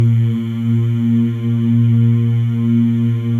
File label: MALE, Symphony of Voices; Spectrasonics